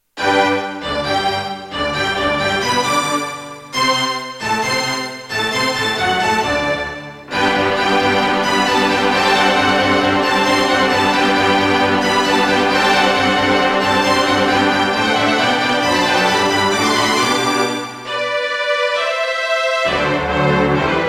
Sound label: Turtle Beach Maui; Mozart - Eine Kleine Nachtmusik partial; classical